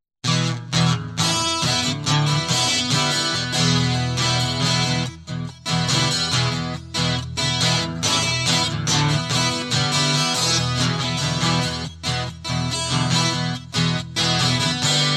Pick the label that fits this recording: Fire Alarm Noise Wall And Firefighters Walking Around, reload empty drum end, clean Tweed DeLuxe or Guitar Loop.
Guitar Loop